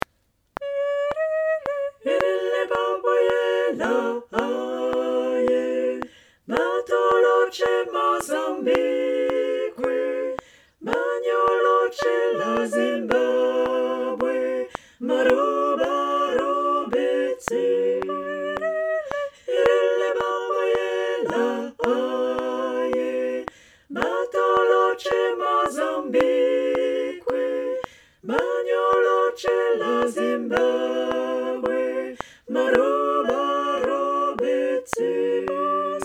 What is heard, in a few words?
tutti